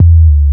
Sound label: Dunk, Drums, Industrial